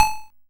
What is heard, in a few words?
Xylo A2; multi-samples; CHIPSHOP DELUXE